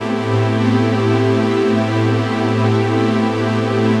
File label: Pretty String Pad, Keyboards